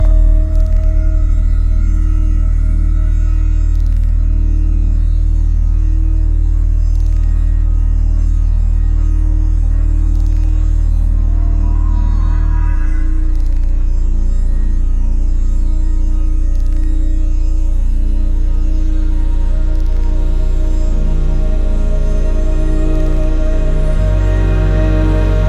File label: Ambience; New Eden; mirror's edge gamerip